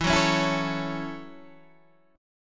F sharp Minor 7th

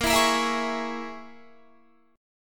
Bb Minor 7th Flat 5th, Guitar; Bbm7b5 strummed

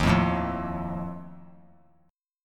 D sharp Suspended 2nd Flat 5th, chord; strummed; JGuitar

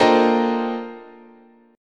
Asharp Major 13th, chord, guitar

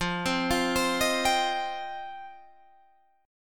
F 7th Suspended 2nd F Guitar DADGAD; F7sus2; 15,15,15,17,18,17; arpeggiated

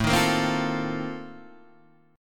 F 11th A, chord

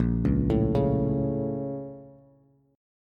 Bb Minor Major 7th, Bass; BbmM7; arpeggiated; JGuitar

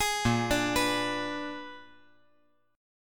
Bb 7th Flat 9th Bb; Bb7b9; arpeggiated; JGuitar

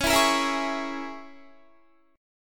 C sharp Minor 6th C sharp, Guitar; C#m6 strummed

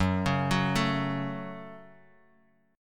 Gb Minor Gb, down, Guitar, Open D

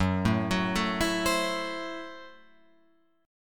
Gb Minor 7th Flat 5th, Guitar Open F; Gbm7b5; arpeggiated

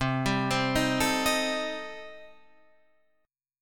C Minor 6th, chord

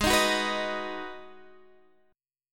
B Major G sharp; B/G#; strummed; JGuitar

